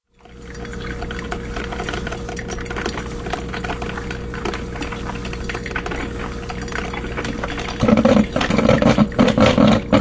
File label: coffee maker dripping